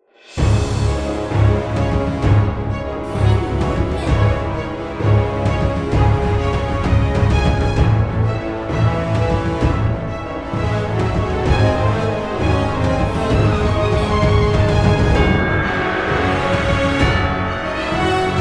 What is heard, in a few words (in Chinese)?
登录